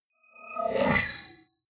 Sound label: swell short haunted; sweeps-swells